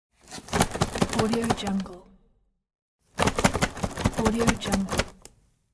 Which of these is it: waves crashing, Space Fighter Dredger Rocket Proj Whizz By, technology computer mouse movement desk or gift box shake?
gift box shake